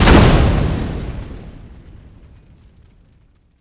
rpg explode fire; Rust

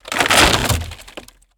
objects, World of Tanks

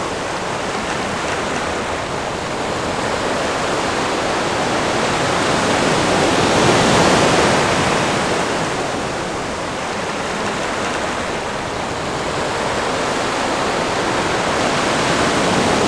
ambient ocean; World of Tanks Blitz; ambient milbase

